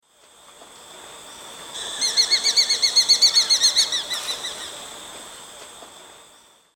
Falco rufigularis, calls; S. America; Eastern Lowlands

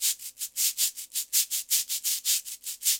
cabassa 80 bpm, latin

